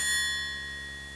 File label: triangle